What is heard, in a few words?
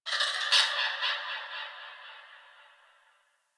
Forest Bird High; Warframe; Grineer Forest